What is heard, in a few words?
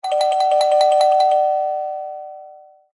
household doorbell ring fast several impatient